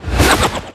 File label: hole rise up; littlehorn